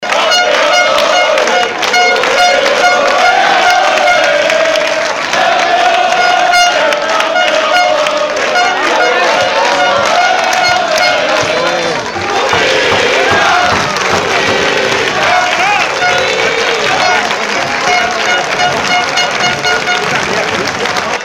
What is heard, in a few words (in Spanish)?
AMBIENTE